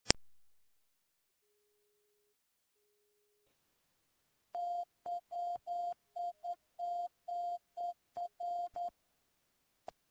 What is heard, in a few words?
skype morse code